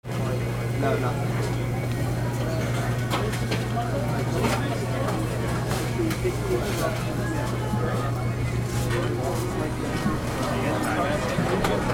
AMBIENCE

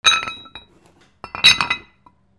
GARAGE

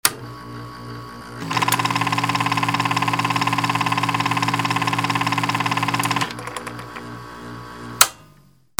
CAMERAS

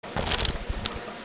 INDUSTRY

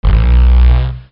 CARTOON

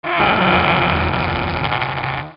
FRICTION

